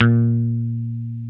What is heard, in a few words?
DAN MUTE A#3, BASS